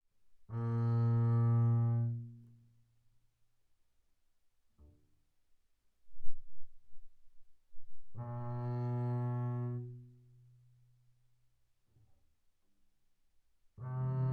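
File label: Bass arco sul A mezzo forte Bb2 A3, double bass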